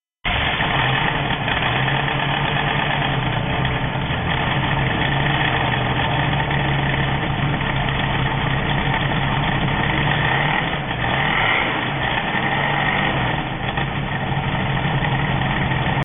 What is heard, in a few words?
ZL-1, exhaust